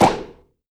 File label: Stamp